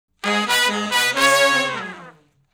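Shuffle Blues Riff (Db) unison falloff, Horncraft for R&B; Crimson Blue and Fabulous Horncraft 4 RnB; Sections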